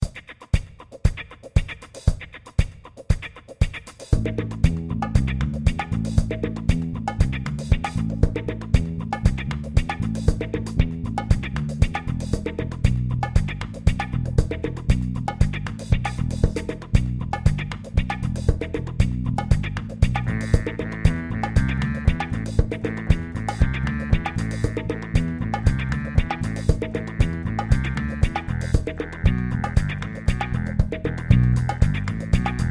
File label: soundtrack; escape the fuzz